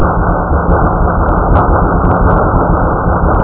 sea

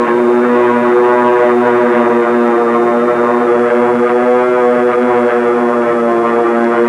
bomber; Sound Library 2000; Wayzata Technology

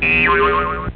Eoing

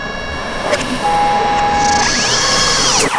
ship wings close

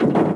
door; DAoC

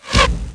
arrow; Odyssey Demo

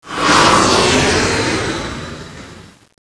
click

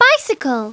bicycle; Treasure Galaxy; Learning Company

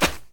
FS Dirt; kult

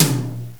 Room Tom; GMPlay; XG

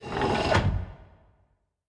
door city

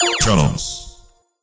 pick cannons; Geschicklichkeit; Bricks Of Egypt

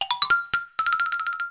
Xylo La; So Xylo La

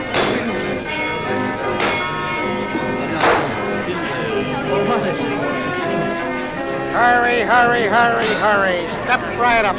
carney loop

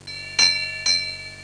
ship bell lo

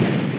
Impact; Starbound II

